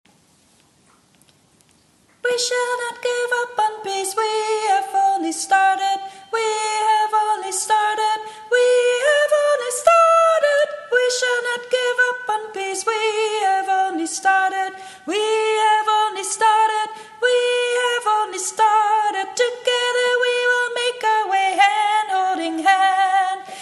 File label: We Shall Not Give Up On Peace Soprano, peace choir